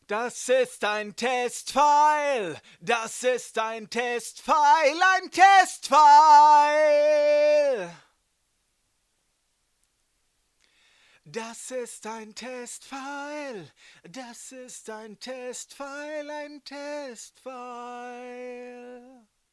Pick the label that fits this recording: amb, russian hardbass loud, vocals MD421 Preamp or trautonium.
vocals MD421 Preamp